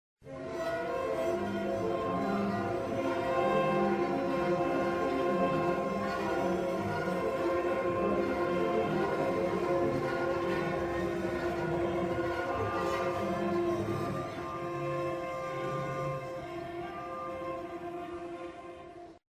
Strings; Alice: Madness Returns unreleased original soundtrack

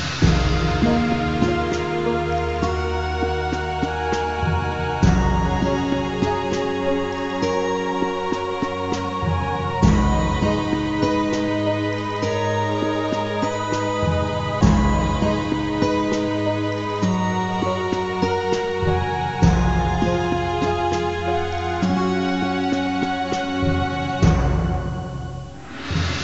Land Loop

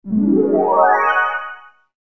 Visiting A Fountain; heroes of might and magic a strategic quest 3do ms dos gamerip 1995

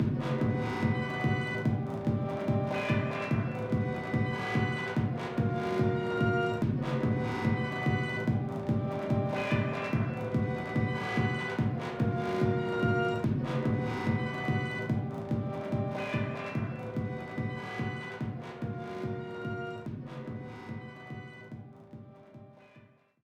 BGM Mission Stage Leak; Alterna C06

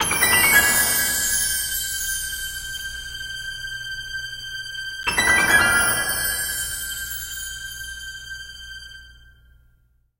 Mystery Stinger

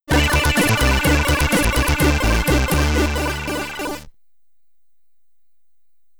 Game Over (SID Stereo); pp hammer; commodore 64

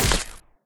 electric pole discharge; ConduitSFX

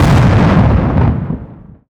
EXPLOSION Distorted Long stereo

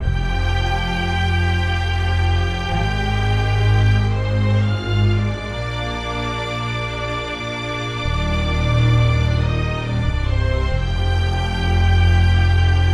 Strings Loop; Arrival

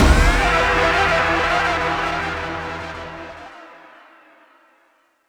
Ending Sting

